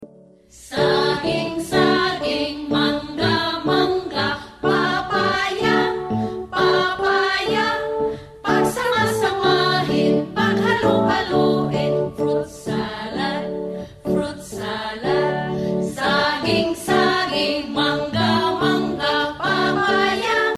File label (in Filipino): saging saging